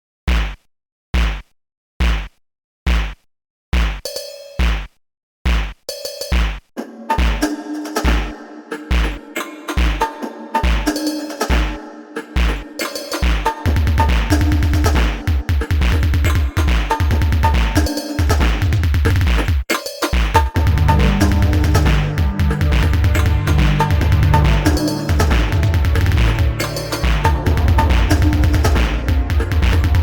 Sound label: roman era drum dub